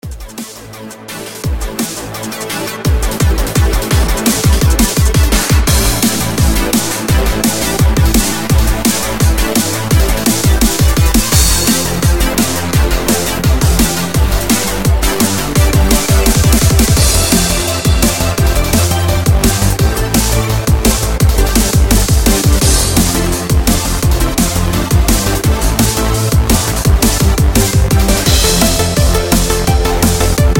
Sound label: fm z3ta+2 dnb